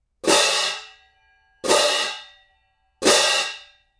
16in a zildjian z-mac with gromets

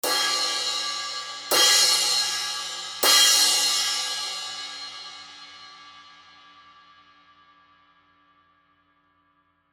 zildjian heavy crash hits

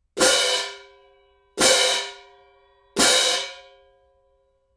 18in a zildjian concert stage

